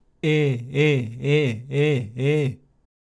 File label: vibrato C e5